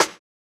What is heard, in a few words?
Snare, jungle breaks drum sounds